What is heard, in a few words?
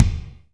Reverb Kick Snare; PS-37 Dance Synths; Percussion